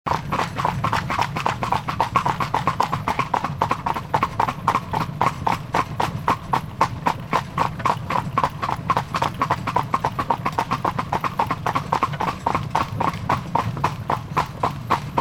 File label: horse carriage on asphalt